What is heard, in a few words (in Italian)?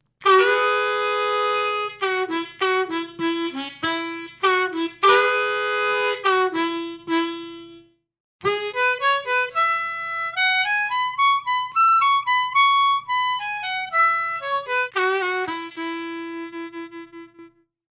ARMONICA FILTRO 5000